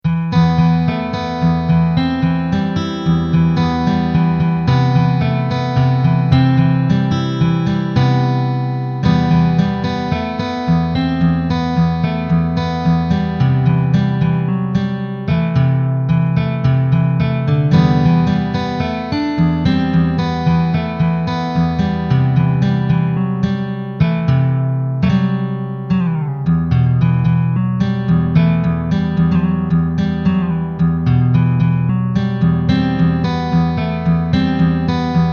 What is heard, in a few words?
acoustic